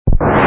Enemy Die

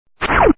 Base Shot

